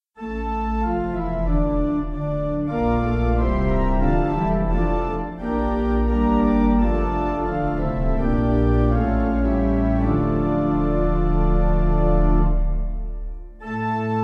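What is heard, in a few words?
pipe organ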